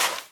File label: sand step; Original TK Sounds